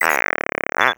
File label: disappointment; player; Portal 2; eggbot